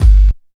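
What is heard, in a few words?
NOISE KICK; East West - Phat & Phunky CD2; 90's Sample CDs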